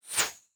Arrow Fly; Fantasy Sounds Bundle; Medieval Combat Sounds

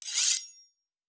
Weapon Draw Metal; Fantasy Sounds Bundle; Medieval Combat Sounds

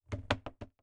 Wood Creak; Fantasy Sounds Bundle; Craft

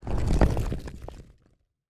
Stone Move; Fantasy Sounds Bundle; Craft

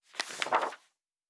Book Page; Fantasy Interface; Fantasy Sounds Bundle

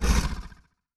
Large Creature Short, Monsters; Fantasy Sounds Bundle; Large Creature 13

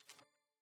map open; DeltaVR; FMOD

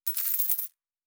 Coins; Fantasy Sounds Bundle

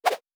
Whoosh; Fantasy Sounds Bundle

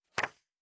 Book Page; Fantasy Sounds Bundle

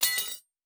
Weapon UI; Fantasy Interface; Fantasy Sounds Bundle

